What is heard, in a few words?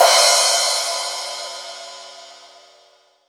Paiste, ALL DRUMS; Best Service; Alpine Volksmusik